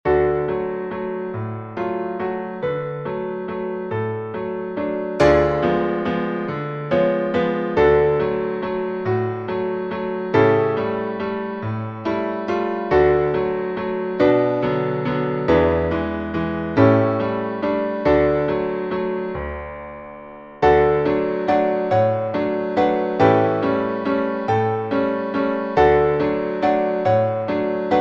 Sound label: piano